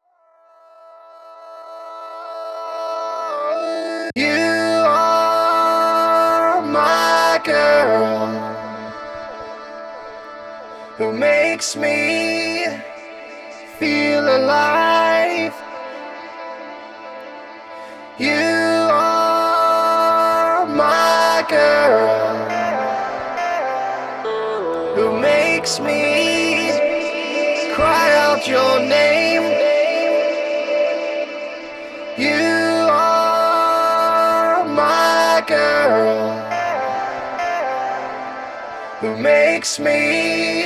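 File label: vocals loop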